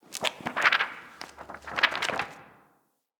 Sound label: Station Misc, Ambience; Soul Plane; Orlick